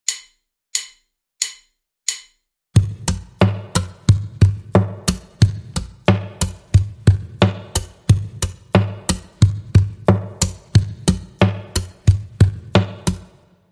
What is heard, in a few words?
percussive acoustic guitar